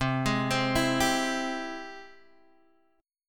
G sharp Augmented Major 7th C

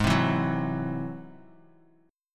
Ab Augmented 7th Ab down Bass Standard; Ab+7 strummed; JGuitar

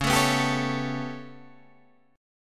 B 7th Suspended 2nd Sharp 5th C sharp

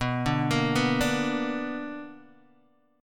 B Minor Major 7th, Guitar, DADGAD

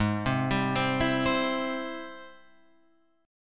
G sharp Augmented Major 7th, Guitar, Open F; arpeggiated; 8,7,7,7,7,7; JGuitar

